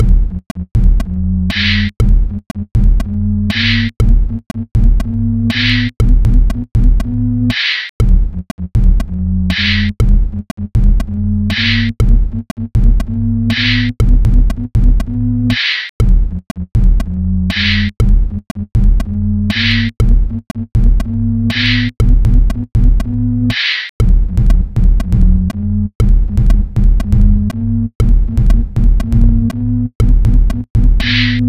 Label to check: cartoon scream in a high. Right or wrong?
Wrong